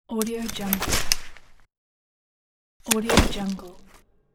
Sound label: umbrella open